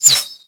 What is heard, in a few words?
Arrow Whizzby; Warframe; Bullet Impacts; Whizzbys